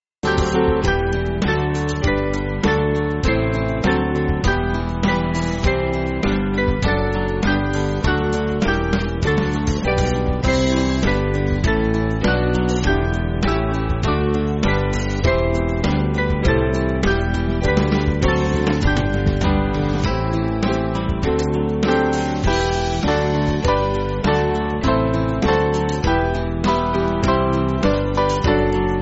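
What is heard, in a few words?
Band, Music Backing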